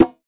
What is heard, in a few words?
Perc Tabla Te